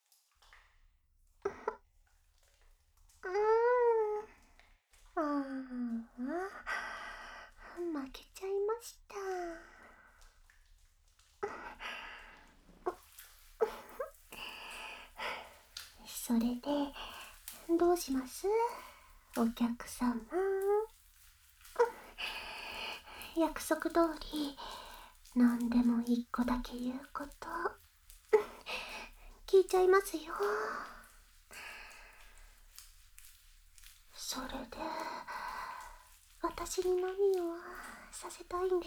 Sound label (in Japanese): シャワーを浴びながらあわあわ手コキ→乳首舐め射精誘導（お風呂場 MIX 効果音あり）; 囁きブースト